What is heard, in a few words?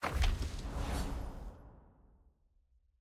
ui collection record open click